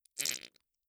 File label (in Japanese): 射精音; 効果音